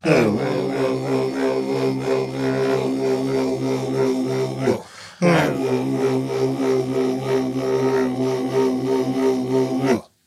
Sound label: Chant; WrestleQuest